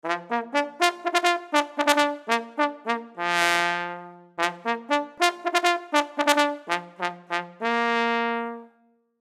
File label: Trombone Military, Trombone Military First Call; Brass; Blastwave